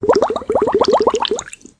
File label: bubles; Fish Fillets